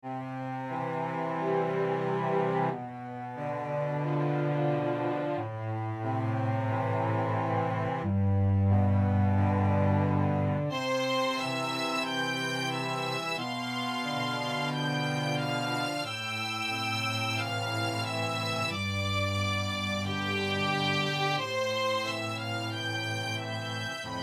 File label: strings